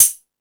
High Hat, E-MU Pro-Cussion

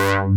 VOCO BASS; Pro-Rec - Synthsonic; Z1 DANCE

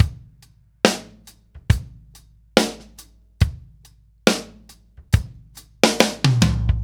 Ballad Loop, Drum, 70s Ballad; Drum Tools

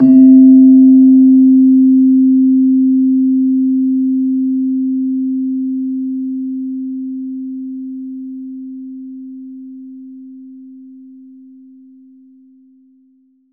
PELOG C2, PELOG BASS; Soundscan vol.60; AKAI

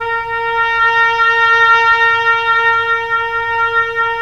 Oboe; Roland - LA Composer vol. 4

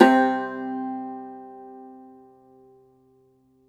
Cumbus SAZ C, Plucked Strings; E-MU Producer Series Vol. 7 – Old World Instruments

